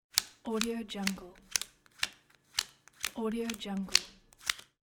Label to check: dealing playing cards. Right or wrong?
Right